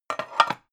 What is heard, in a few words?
pan stack slide